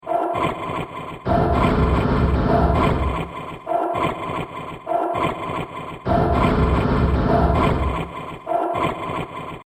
Industrial Loop, horror; Industrial Loop 1 - Horror Fx; Miscellaneous Loops; Andrew Fleming

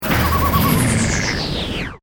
Sweeper, Wind, Down; Sweeper Sound Effects; SoundEffects-BlastWave

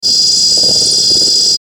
static high; Static High Frequency Low Click

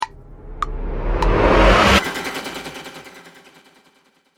Radio Stinger

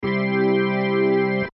Music Organ Chord, Music Organ Chord Stab; Keyboards; Musical; Sports

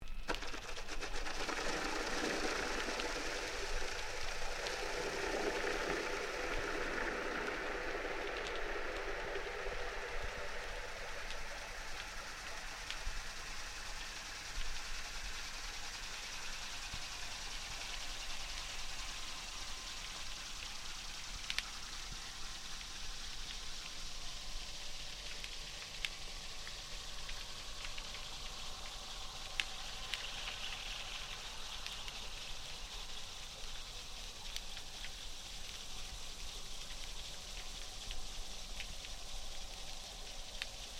Underwater Propeller Small Boat Operating; Boats; Vehicles

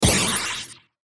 button sweeper ascend; Multimedia; Blastwave

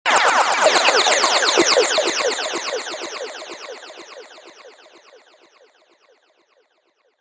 Random Laser Blasters; Laser Gun Sound Effects; science fiction sound effect; star wars / trek style games; Andrew Fleming

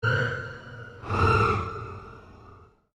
Created Cyber Whale Call, Alien Sound Effects

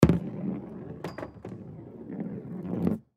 Ski Ball Game Roll Up Roll Down, Arcade; Games; Sports; Arcades (Sound Effects:Games); Blastwave

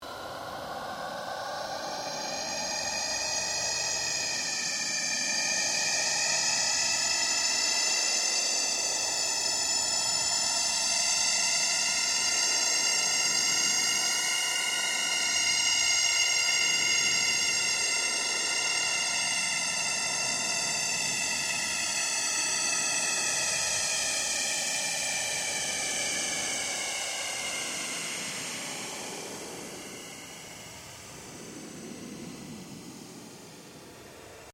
Ambient Drone Ghost Chatter

